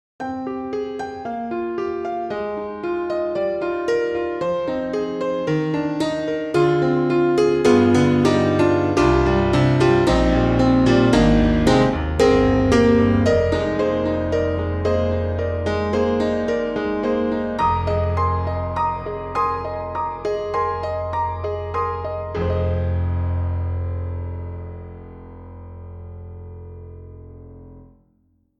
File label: Giant Pop; Native Instruments